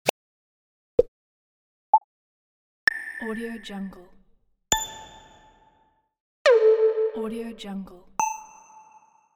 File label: pluck